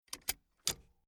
Transport Old Tractor Handbreak Released Slowly Single; Tractor Sound Effects